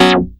SHORT CHOR; Masterbits; Vintage Voltage

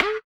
BUZZER; Spectrasonics; Metamorphosis

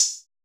cymbal, Roland MC-909

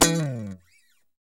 GTR SCRAPE, Clean E.Gtr, Strats; Roland L-CD701; Clean E.Gtr4; PYB Strats

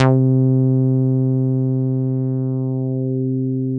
JV TB303 ACID; VINTAGE-EXP

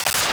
Glitches; Antidote Advent

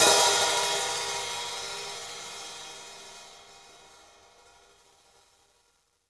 hihat - opened; JazzFunkKit

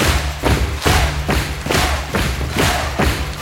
Crowd Effects

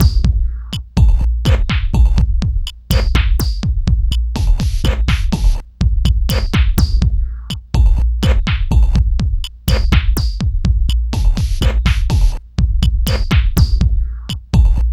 124, loops

